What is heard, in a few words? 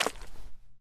mud, Footsteps; zps; Foley